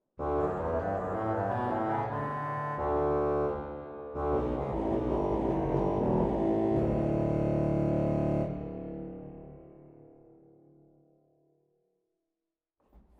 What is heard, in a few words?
Pedaal Basuin 16; zwolle